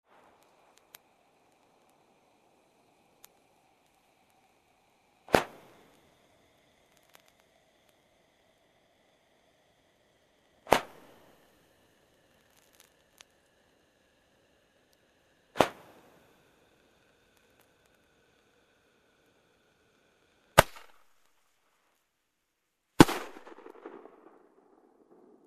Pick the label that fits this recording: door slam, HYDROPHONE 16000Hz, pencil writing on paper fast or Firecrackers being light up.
Firecrackers being light up